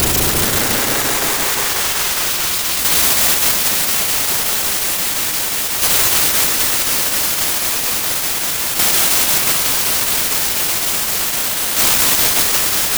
OTHERNOISE, GRANULAR, Disjointed Textures; USB Soundscan vol.33; AKAI

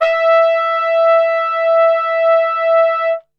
E 3, Brass; Best Service; Brass Super Section

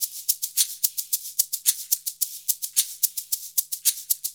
110 MIX, Percussion Loops

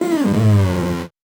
VocoBit Noise, 8-bit; musicradar; 8-bit bonanza

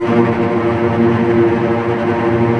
Cel Trm A#1; Digital Sound Factory Virtuoso

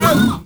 MALE WAR, SHOUT; Heart of Africa; Spectrasonics; Heart of Africa 1; CH. SHOUT2

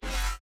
Bass Stab BPM150 Fm

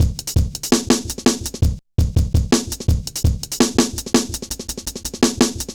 Jazz Shuffle, Drum Loops

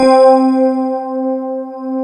metal tines; DCS KEYS

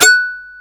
DS8 F#4, Korg; Back In Time Records; Korg Universe VOL-1

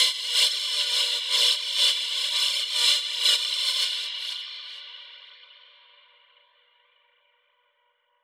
Verb Proc C, alarm siren, 125bpm; musicradar

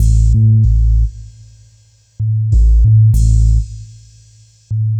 bass, Roots Funk; USB Soundscan vol.41; AKAI

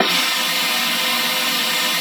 Oboe; Xsample VOL-6

